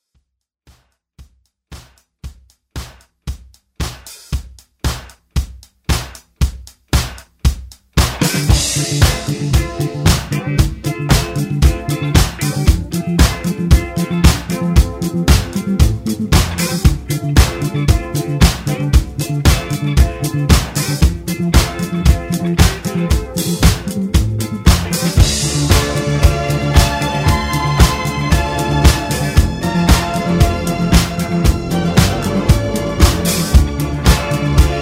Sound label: 70s Pop Rock Redrum